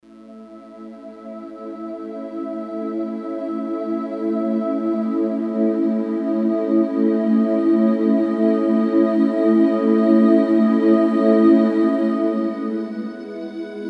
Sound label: Ozone Choir, synth